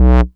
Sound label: Bass Multi Rev H; Vengeance Samples